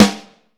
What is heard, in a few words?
Vintage Snare Drum, 6.5X14